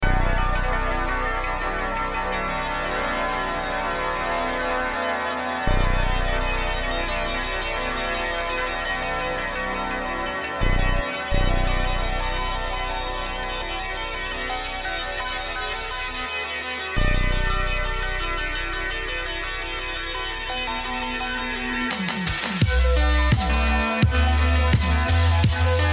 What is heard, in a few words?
chillwave; r type 1987 gaming